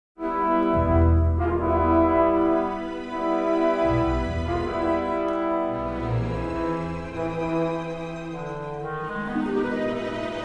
music flourish

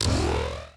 servo, droids robots

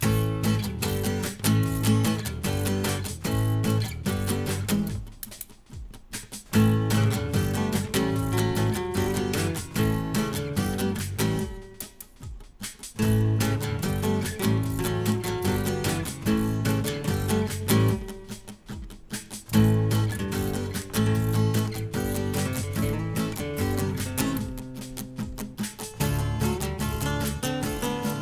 music shop; Ty The Tasmanian Tiger 2 Bush Rescue; XBOX

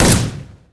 Handgun Fire

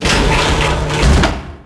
door sliding metal close; Max Payne

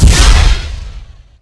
Plasma Impact Large, Plasma Rifle

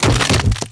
deadfall metal, foley

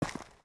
FS Dirt, Footsteps

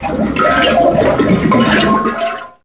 water cooler bubbles; Max Payne

